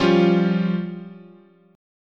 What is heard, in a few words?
F Suspended 2nd Sharp 5th, chord